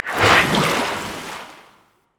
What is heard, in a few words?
emote laugh; LoL; nami